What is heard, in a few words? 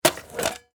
Technology Film Reel Case Open; Projector Sound Effects; Projectors (Sound Effects:Offices)